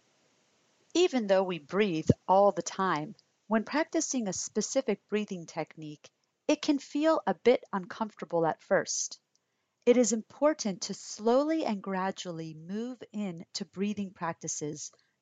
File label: Breathing